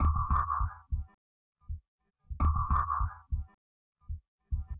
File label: Deep Beat, Electronic Beats; Sonic Foundry (Sony Creative Software); Myths of Technology